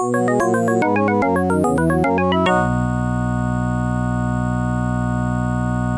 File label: Upbeat; Example Modules